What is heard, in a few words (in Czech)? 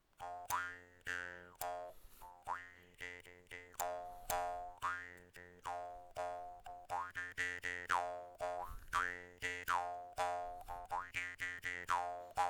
bambu, didgeridoo